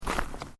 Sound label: Footsteps Boots Road Gravel Step; Footstep Foley; Human